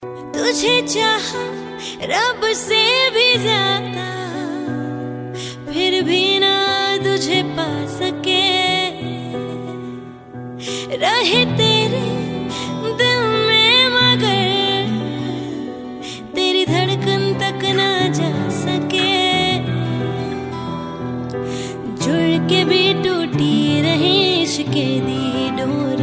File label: Unplugged